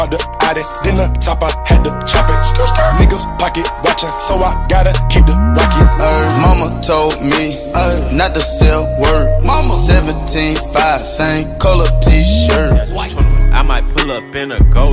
new hip hop

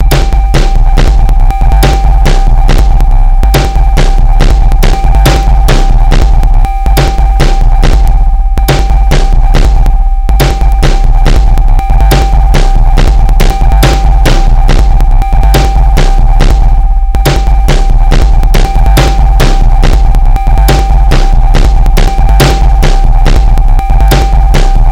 california sad music, ringtone

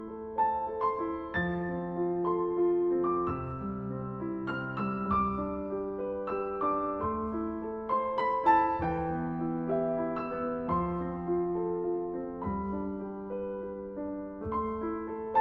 relax piano music

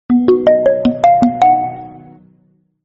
new nokia notification

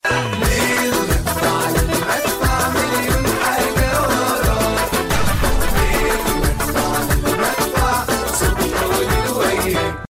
arabic egyptian mix

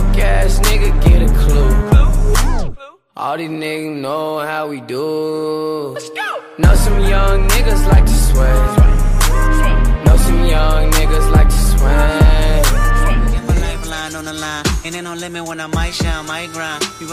new hip hop, ringtones

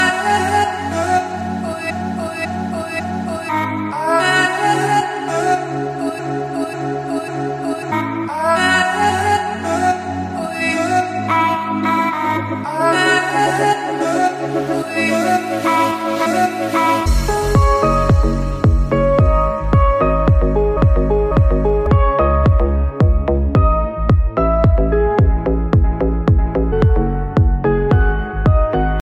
vocal instrumental ringtone

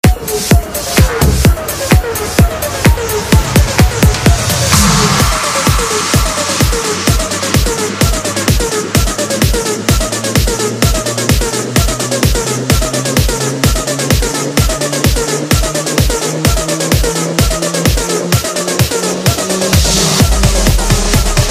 electro hard

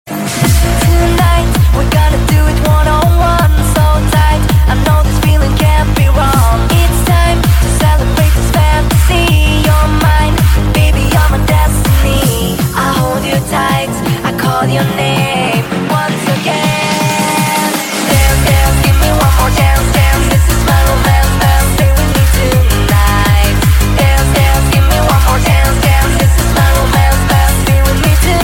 norwegian dj tune

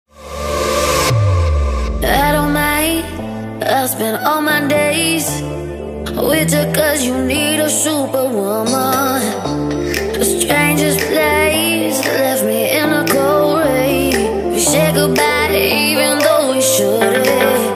hard rock electro

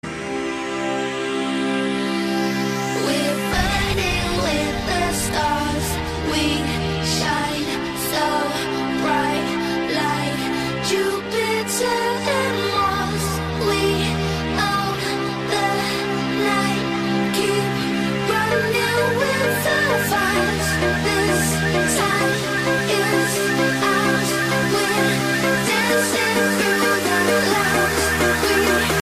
trance; tomorrowland

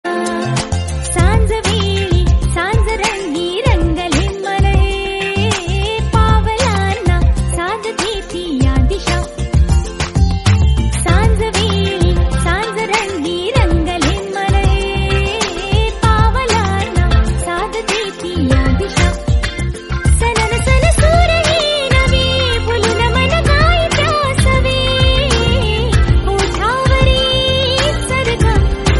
marathi tone tune

